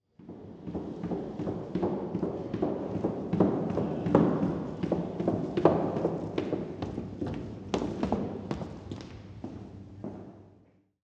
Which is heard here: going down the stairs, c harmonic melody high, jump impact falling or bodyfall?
going down the stairs